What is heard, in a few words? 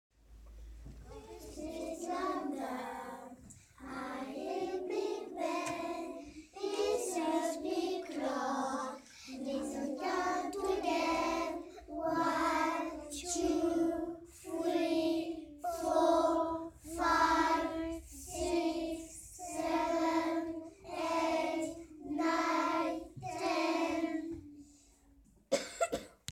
bigben